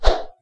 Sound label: throw